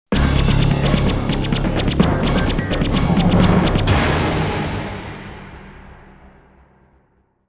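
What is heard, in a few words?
jingle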